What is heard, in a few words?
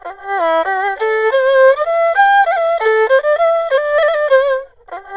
ERHU; INIS; Opium